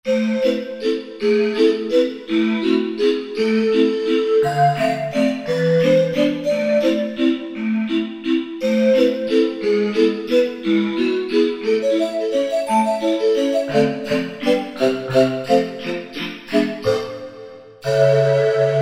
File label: Korg Wavestation A-D